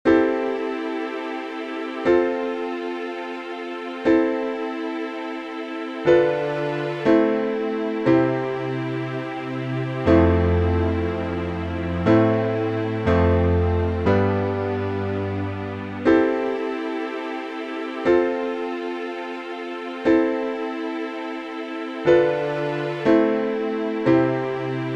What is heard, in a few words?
C Maj bridging flavors